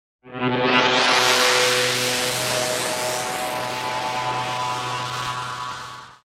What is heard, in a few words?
Flanging Synth Tone Fades Into Electronic Buzz; Electronic Sci-fi